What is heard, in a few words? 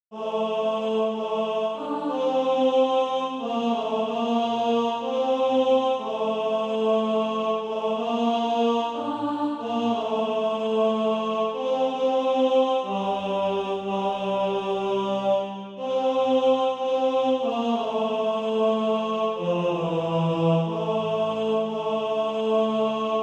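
tenor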